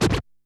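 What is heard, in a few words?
Drum Analog Kit Scratch High, Yamaha RM1X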